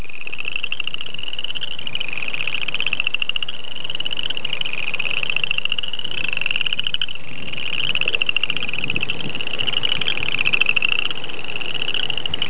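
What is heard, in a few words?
upland chorus frog; frogs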